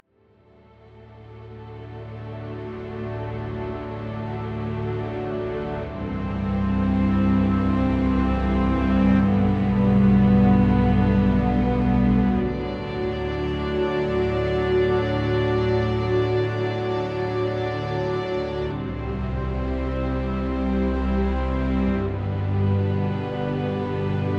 Camp Strings C

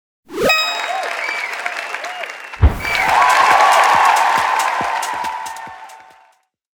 Win

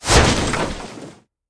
Building Destroyed; The Croods

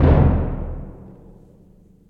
B Drum

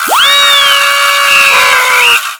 party favors

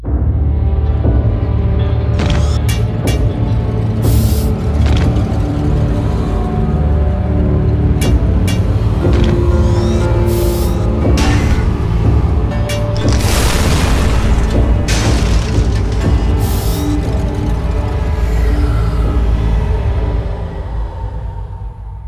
Robot; Cutscene; Time Mysteries The Ancient Spectres

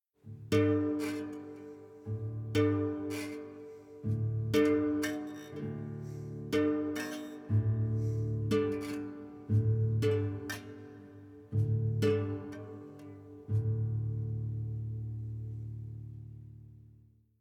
Savannah Music; kenshi windows gamerip 2018

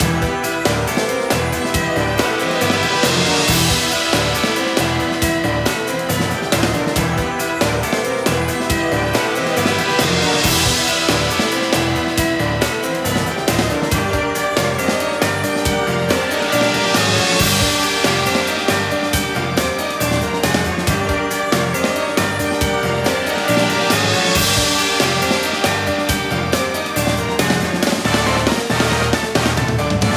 Forest Dungeon Boss; Tinkertown

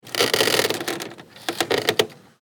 Rock; F.A.M.P.S

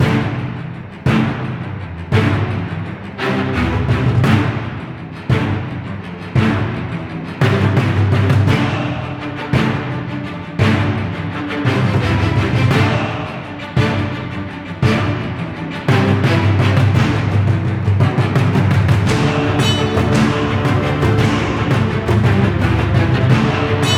Big battle Full

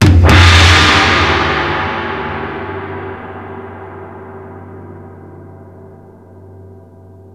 taiko; Kinnikuman Muscle Grand Prix Max; ps2; dora

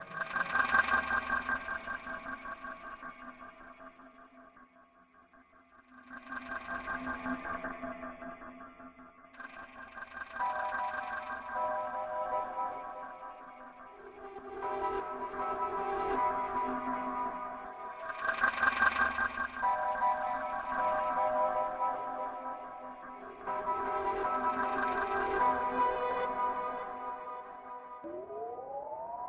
Fight Background; We Happy Few

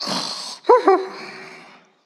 Dog Sleeping; Prison Architect

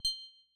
terminal new message

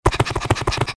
Run pant; F.A.M.P.S

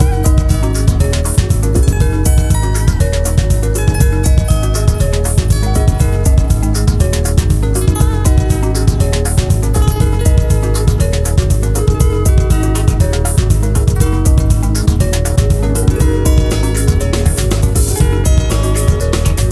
asian shuffle loop; arcade; gamerip; the fast and the furious super bikes

